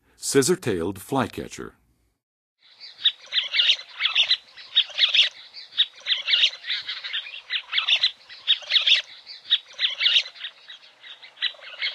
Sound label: Scissor-tailed Flycatcher, Songs of Birds of North America; Western USA; Western USA Songbirds